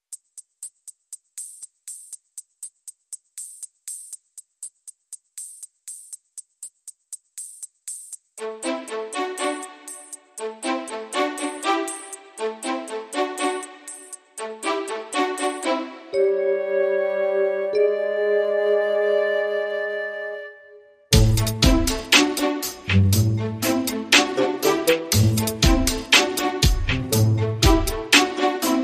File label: Modern Percussion